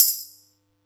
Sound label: Yamaha EX5, Drums